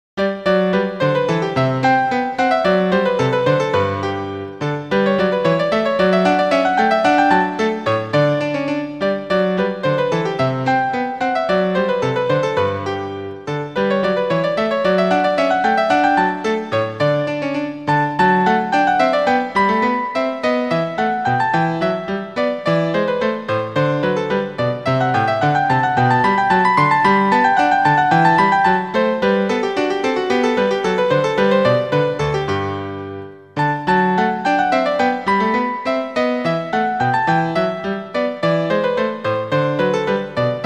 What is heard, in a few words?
bourree; handel